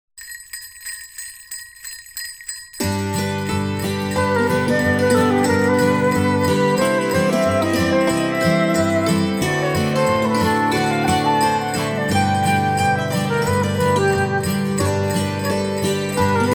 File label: Jingle Bells